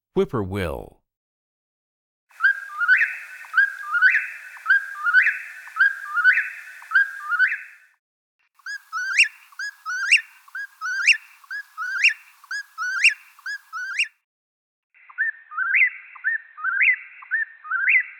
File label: Whippoorwill